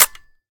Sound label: empty; svencoop; ins2